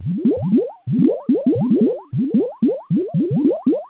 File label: boil